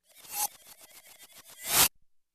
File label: Noisy Disappearing Sound